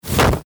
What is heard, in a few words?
body Slam; Chilli; Lucha Rumble